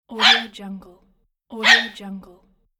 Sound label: small dog